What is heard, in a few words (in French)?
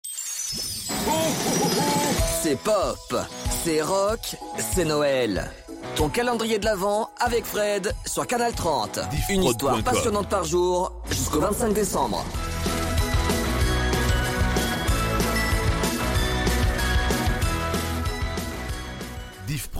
Calendrier de l'Avent Noel Intro Chronique Podcast